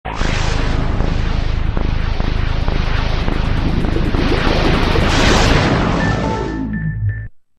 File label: original xbox startup